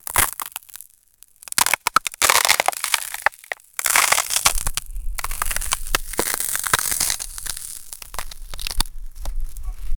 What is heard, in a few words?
ice